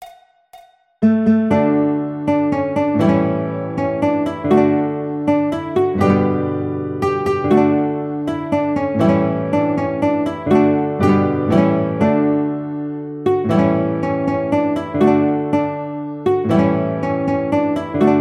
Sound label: Las Mananitas Guitar